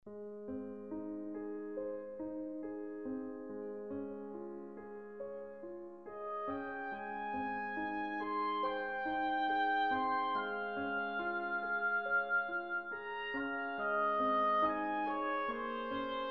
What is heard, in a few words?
oboe; modular series oboes; kontakt; amplifyiso